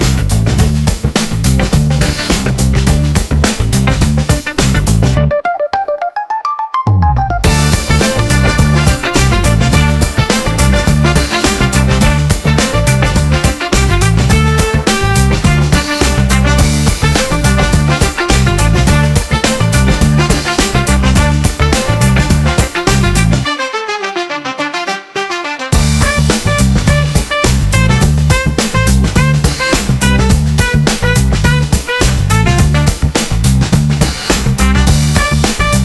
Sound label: Funky, upbeat funk backgrounds